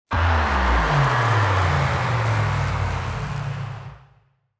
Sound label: designer magical hit; SoundsCrate